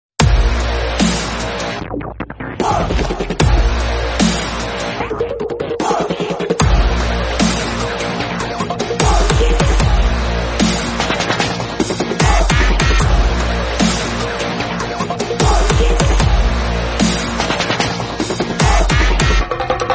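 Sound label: background music; puli murugan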